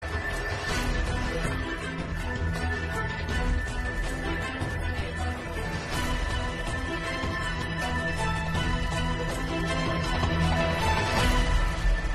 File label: scary